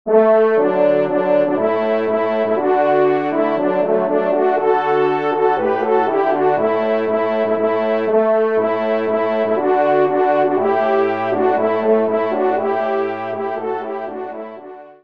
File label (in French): Offertoire, trompe; Thiberge; Trois Trompes ou Cors